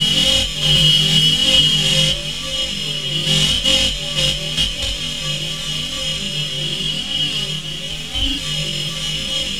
Lava Plain, Environments; Sonic Foundry (Sony Creative Software); Myths of Technology

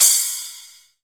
Crash E; Jungle; Pecussion Hits; Zero-G Jungle Warfare 1, 2 & 3; 90 s Sample CDs

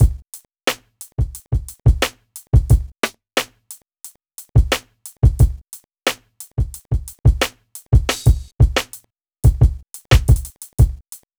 Drums, Hip Hop

